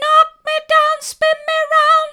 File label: knock me down spin me round soft, vocal